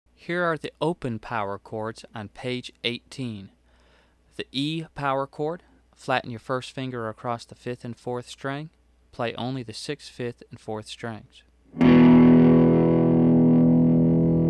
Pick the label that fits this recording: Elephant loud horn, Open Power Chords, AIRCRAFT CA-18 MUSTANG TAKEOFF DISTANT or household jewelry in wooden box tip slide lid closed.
Open Power Chords